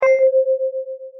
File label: cloche c5